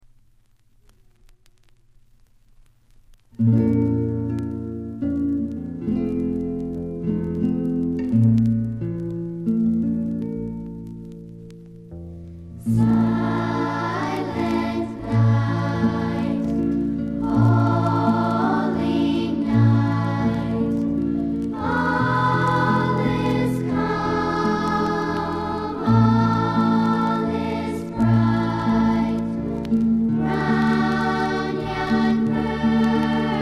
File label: Choir, Silent Night